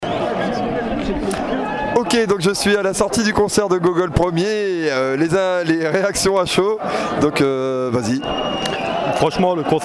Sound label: concert